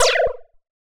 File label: Cry